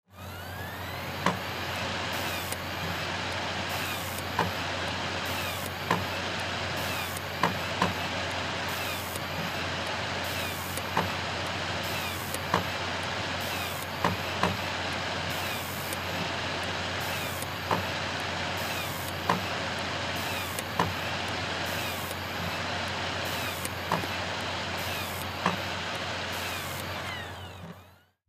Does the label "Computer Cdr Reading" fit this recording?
Yes